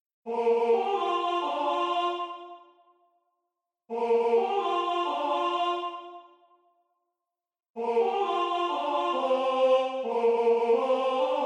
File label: MIDI SATB a cappella